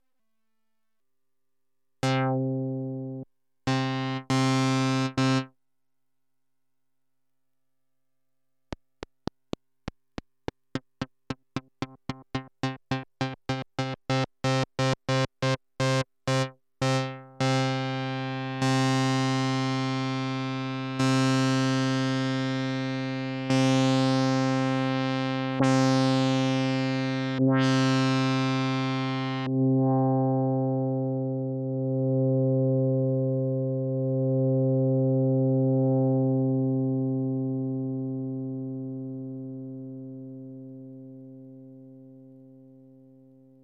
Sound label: adsr, novation bass station rack